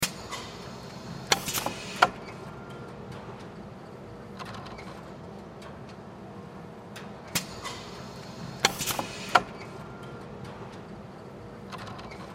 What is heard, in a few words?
Pneumatic Cutter; Factory Assembly Line; Machinery; INDUSTRY